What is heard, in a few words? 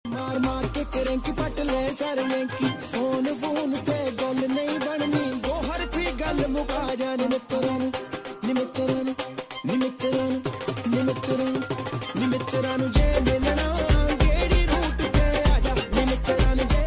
Desi Punjabi Ringtone